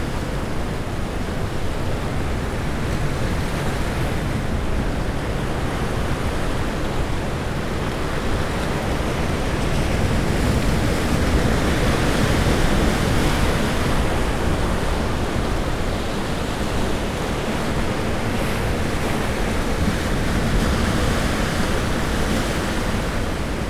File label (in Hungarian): 8 meterre a viztol, ocean; orzola